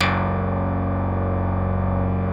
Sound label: BASSES; Wizoo - Powered Wave